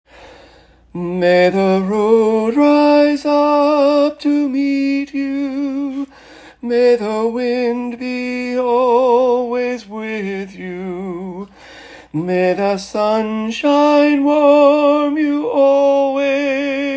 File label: Irish Blessing Tenor Harmony; St. Anthony of Padua - Choir Sunday